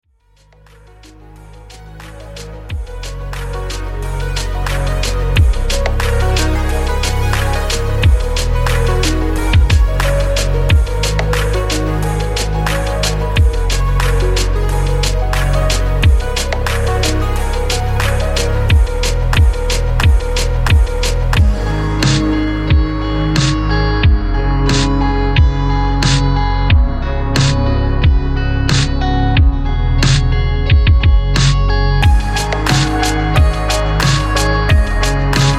BGM

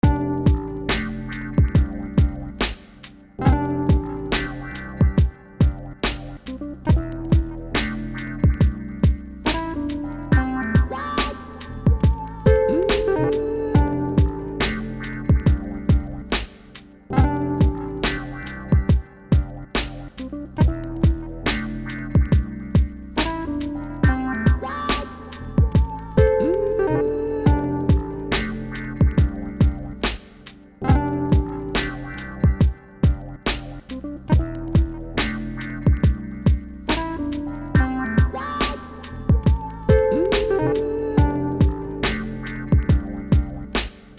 Beat

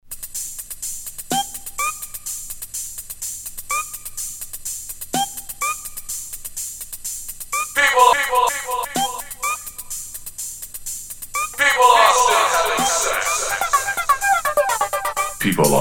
Accapella

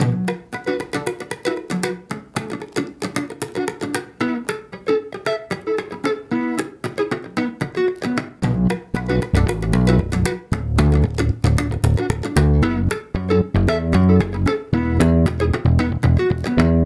Rhythm + Bass Guitar

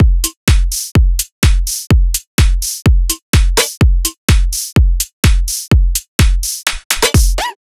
House Loop

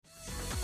Groovy House Mix

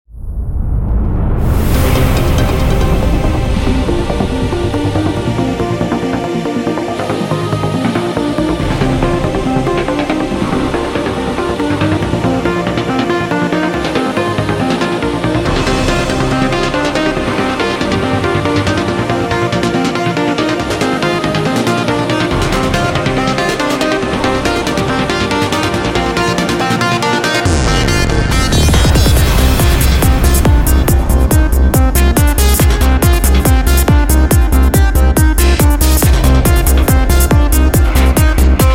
Techno

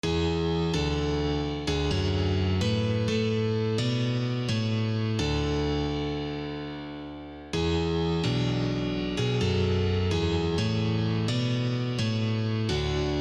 Piano roll

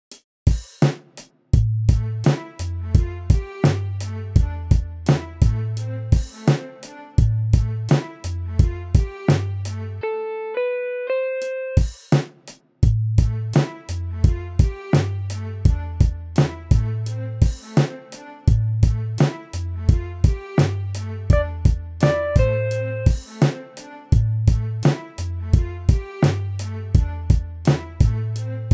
Beat

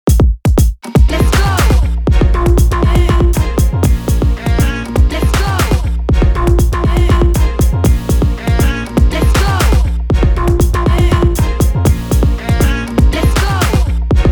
Bass Bomber

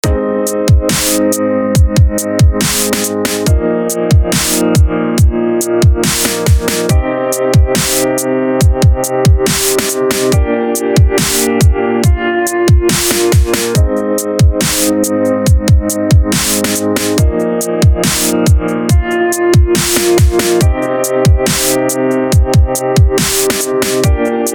DubStep & Piano

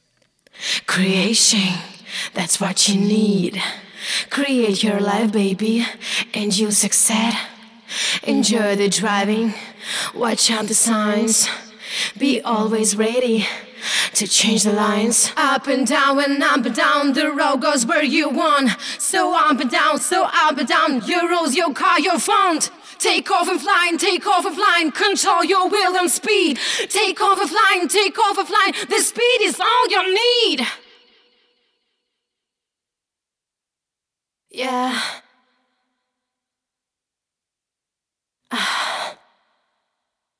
Acapella Wet, Key E, 122 BPM; Clubmasters Records

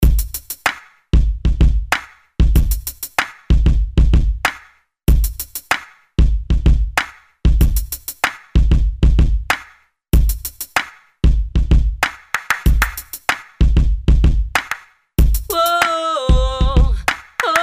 Rap

